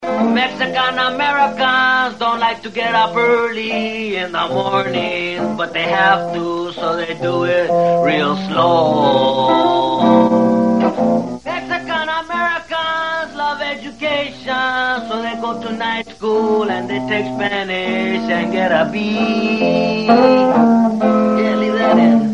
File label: mexican american new tone